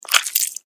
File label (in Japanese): クリーチャーが食べる音